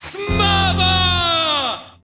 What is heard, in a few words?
blow